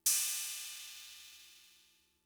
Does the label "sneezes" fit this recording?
No